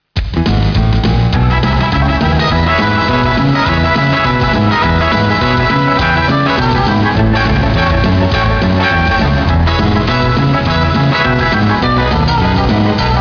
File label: rockroll